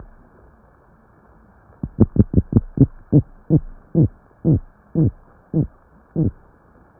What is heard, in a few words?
alarm; nassau